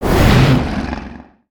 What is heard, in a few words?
scratch